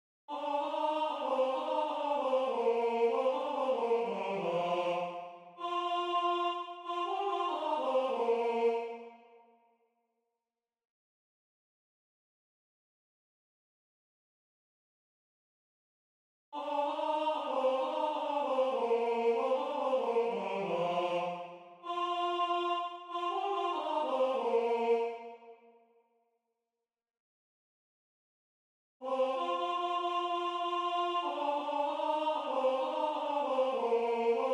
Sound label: Tenor